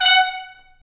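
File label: brass trumpet stab; Roland L-CDX-03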